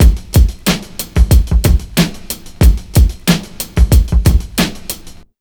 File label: 92 BEAT, Pure Electro, BEAT MIX; USB Soundscan vol.03; AKAI